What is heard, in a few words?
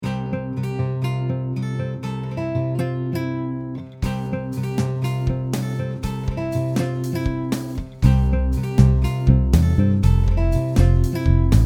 Bckg Music